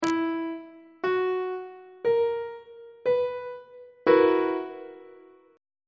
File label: c flat major 7th suspended 4th 1st inversion on treble clef